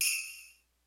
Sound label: HATS CYMBALS; DRUMS; Kid Nepro; Elite